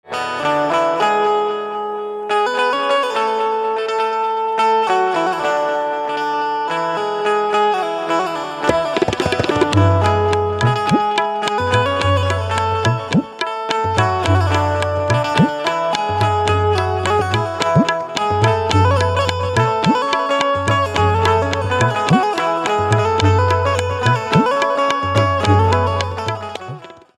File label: Banjo with Table